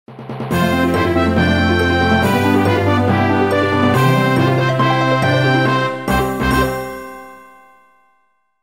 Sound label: Order Jingle; Order Land; Yuusha